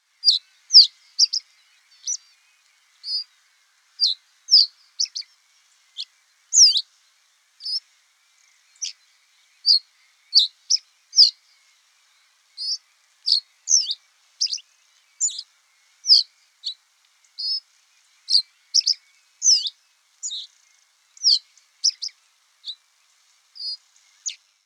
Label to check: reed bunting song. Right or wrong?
Right